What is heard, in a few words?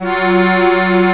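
accordion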